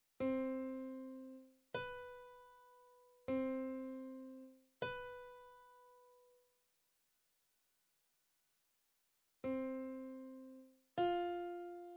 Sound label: Recognise Notes C B C F C A G A G D G E F Bb F D F E; Grade 3